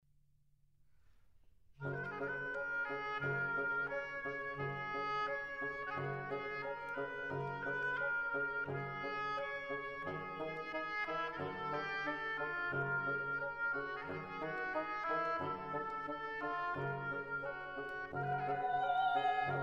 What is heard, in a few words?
Pastorale song without words for voice piano